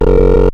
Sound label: Error